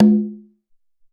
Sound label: Cong Lo